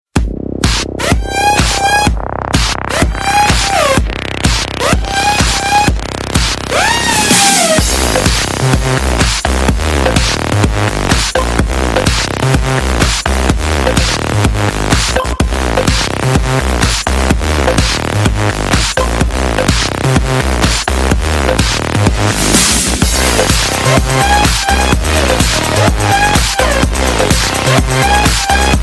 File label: electro; step up